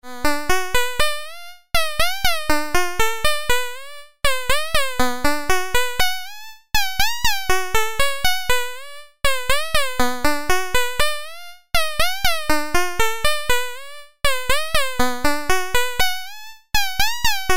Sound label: CV Stepper, glide